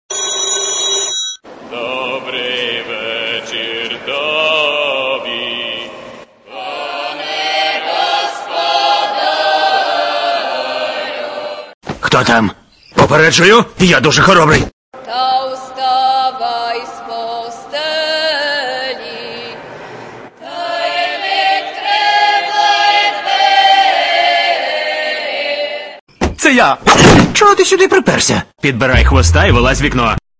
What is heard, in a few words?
ring